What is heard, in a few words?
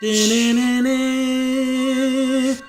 Zelda chest open; Snapcube Sonic 06